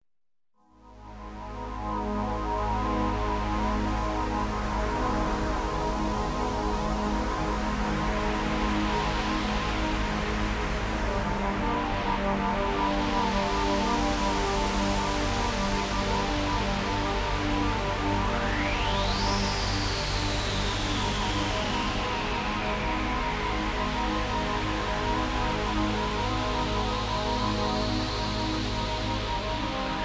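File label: synthesizer melody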